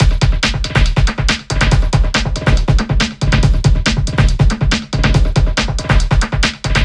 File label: Break Beats, 140 BPM